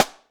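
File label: snare Jung, EMU Orbit-3 drum machine; 300 Drum Machines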